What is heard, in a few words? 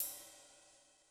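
Ride Sizzle, Cymbal; Yamaha DTXpress-II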